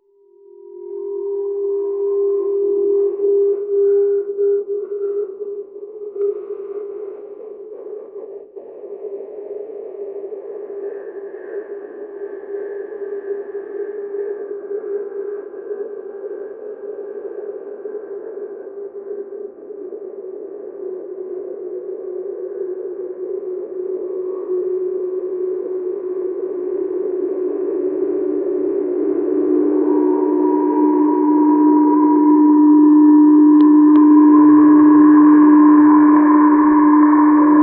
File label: interstellar ambient sound, distortion